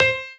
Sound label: piano melo